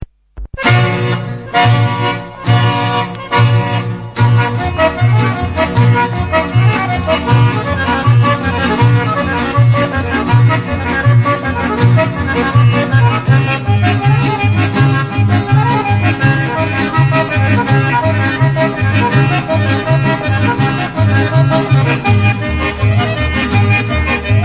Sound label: Yugoslavian, Instrumental; Cacak Godeski Cacak